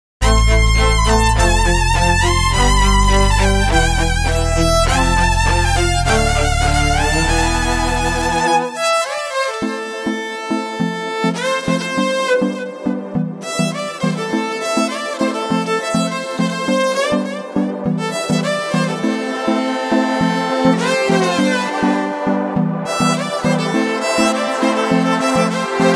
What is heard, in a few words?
electric violin cover